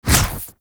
Ghoul Drill Flame Burst; Warframe; Grineer Ghouls; Ghoul Drill Sergeant

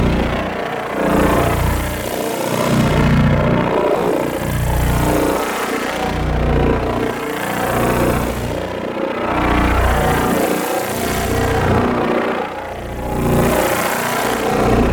Boreal Prismatic Shield Loop; Warframe; The New War; Archon; Abilities

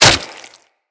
Dagger Flesh Impact; Weapons; Dual Daggers; Hit Effects

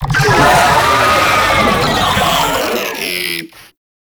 Idle, Vocals; Warframe; Proto Infested; Babau

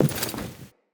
Walk Default, Footsteps; Enemy; Characters; Lady Deora

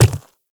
Quadruped Medium Walk Default, Footsteps; Warframe; Infested

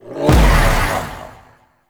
Execute Heavy Strike; Warframe; PaxDuviricus

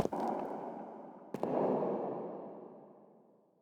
Steps Stand Up, Foley; Warframe; Cinematics; Apostasy

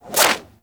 Serpent Ability Tendril Whoosh

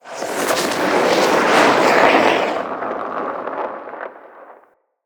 Arrow Barrage Whoosh; Level Events; Midrath; Warsong Prologue

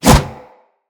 Melee Kick Whoosh; Weapons

